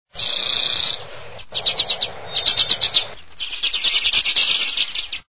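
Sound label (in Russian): chechetka, redpoll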